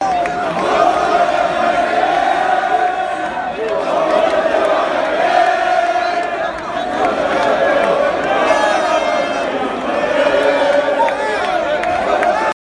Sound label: Football Fans Chanting In Brighton